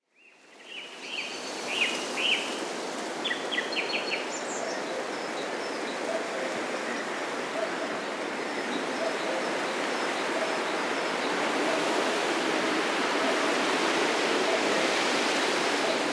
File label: Wind In Trees And Birds